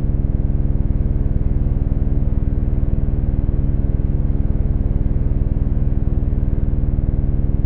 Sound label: reconstruction amp Mod Model no Phase Mod